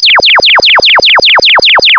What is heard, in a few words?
payoff; pvpoker